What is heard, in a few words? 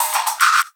Fill; Sonic Foundry (Sony Creative Software); Esoterik Beatz; PH7 FX